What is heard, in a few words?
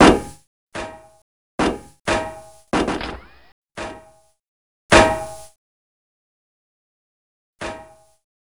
small arms impact, Weapons